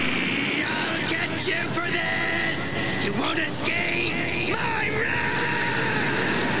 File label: death; action-quake2; vegeta2